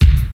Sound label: studio kick drum single shot c sharp key